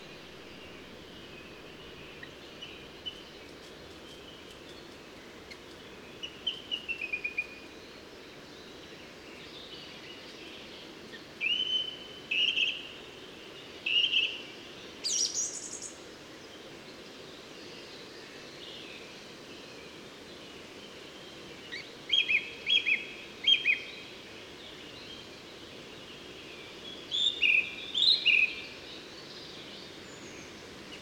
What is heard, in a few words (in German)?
Singdrossel; Moos DEG; Michle Peron